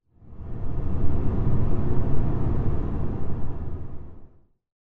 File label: tunnel pass; Dystopia; fortress